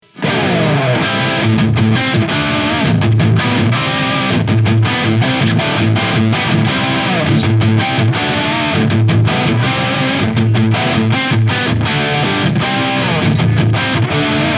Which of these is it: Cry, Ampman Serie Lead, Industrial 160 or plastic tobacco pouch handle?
Ampman Serie Lead